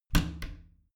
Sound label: bathroom cabinet creak close heavy; objekte; tueren